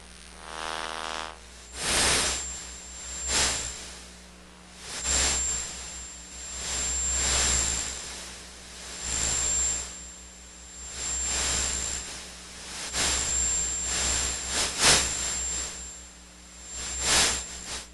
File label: static passbys; Sonniss GDC